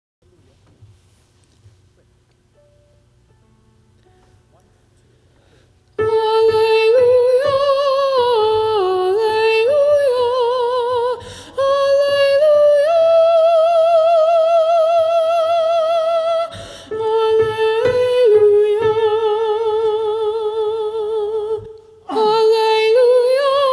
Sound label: alleluia sop